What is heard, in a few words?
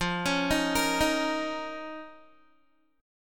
F 7th Suspended 4th Sharp 5th F down Guitar; arpeggiated